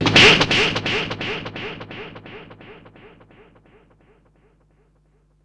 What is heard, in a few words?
FX, Drum & Bass